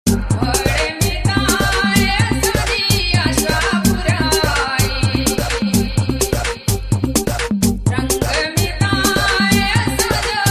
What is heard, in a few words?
Arabic Sound